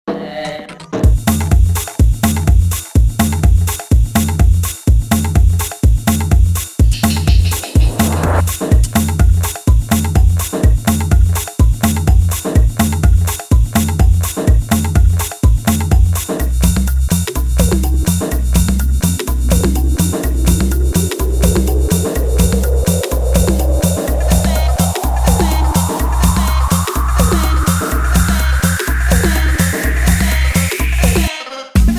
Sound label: Friendly Funk